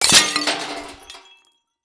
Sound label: Impact Glass Debris Dropped On Trash Can Lid; Impacts